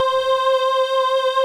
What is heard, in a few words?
CHORALIS, DANCE; Pro-Rec